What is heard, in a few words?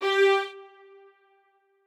strings